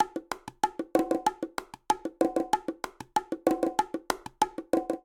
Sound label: Guaguanco 2 Bongo 190bpm; Latin Percussion; Ableton; Factory Packs